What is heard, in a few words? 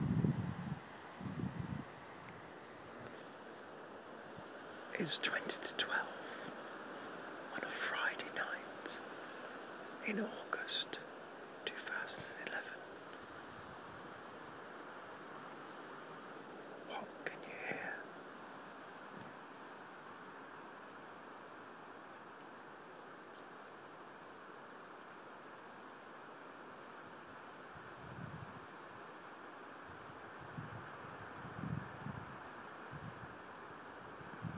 shhhhhhhhh